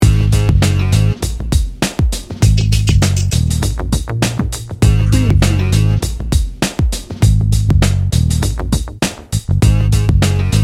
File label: hip hop bounce